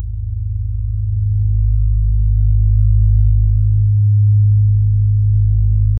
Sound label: low drone silence; silent